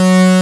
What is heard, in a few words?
JUP8 PULS F3, Jupiter Synths; E-MU Formula 4000 Series Vol. 3; Analog Odyssey